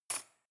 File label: cutlery cake fork drawer place